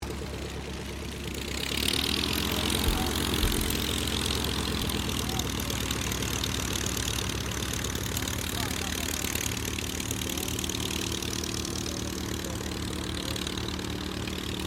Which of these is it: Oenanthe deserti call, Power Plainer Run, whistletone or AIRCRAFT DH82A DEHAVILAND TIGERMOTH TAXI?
AIRCRAFT DH82A DEHAVILAND TIGERMOTH TAXI